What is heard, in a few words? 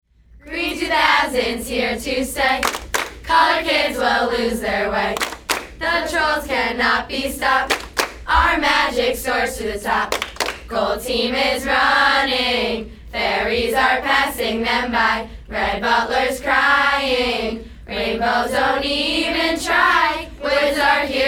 cheers